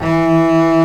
STR BOW, Str Quartet, Strings

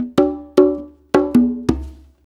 Blues Perc Loop; Drum Tools

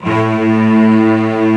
Section String A1; Proteus 2000; Digital Sound Factory Proteus 2000

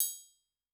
Triang, Yamaha DTXpress-II, Perc

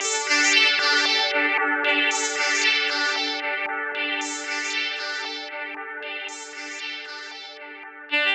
D Phrase Warped Arp; Zero-G; Future Wave

